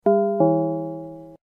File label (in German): voralarm